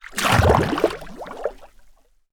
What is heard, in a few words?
Water; TOOLKIT vol.2